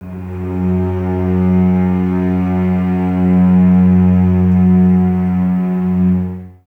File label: Cellos F#2, String Ensembles, No Vib; Miroslav Vitous